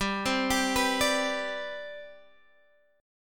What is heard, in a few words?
C Major 7th Suspended 2nd G, chord